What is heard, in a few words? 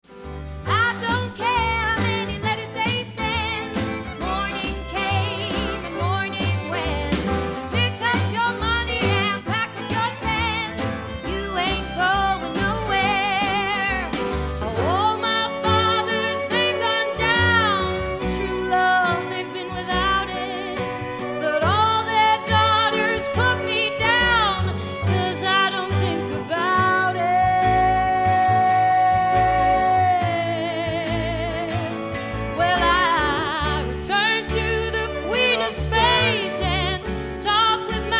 Singing